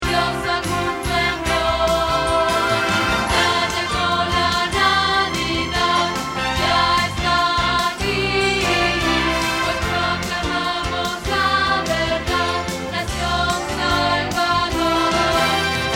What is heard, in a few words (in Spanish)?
Contralto